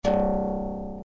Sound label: harp, Harp; Real Samples